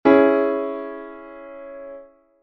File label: C♯m, chord inversions